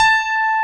CP70 A4, E.Piano; E-MU Producer Series Vol. 2 – More Studio Essentials; CP'd E.Piano

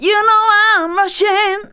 vox, Techno Trance; Ueberschall

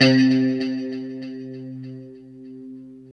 LO E C 3, Guitars; Spectrasonics; Hans Zimmer Guitars VOL-1; JC-SHEEN

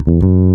DOPE BASS; E-MU Formula 4000 Series Vol. 1; Hip Hop Nation; Big Bottoms

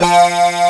AN SYN, SYN & LEAD; SAMPLE WAVE MIXING 2

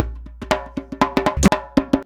119 DJEMBE, Modern Percussion Loops; USB Soundscan vol.56; AKAI

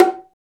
Bongo Hi, Roland R-8

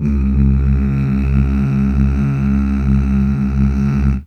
Mmh Male; Best Service Gigapack 1; CH BACK6

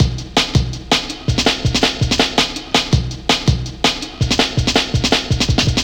164 Insects, breaks; m8 breaks

